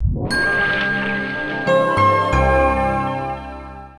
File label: Windows Logon; system; registry